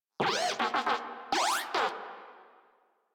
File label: Moa Compassionate Vocal Affirmation, Pets; Warframe